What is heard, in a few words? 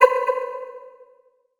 sound design effect howling short; sound effects one